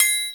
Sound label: Perc, Linn AdrenaLinn1